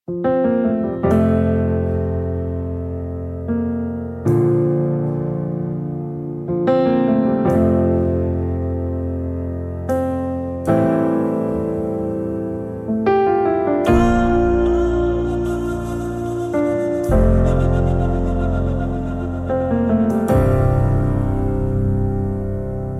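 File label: background music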